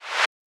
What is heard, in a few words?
Swipe; Dashboard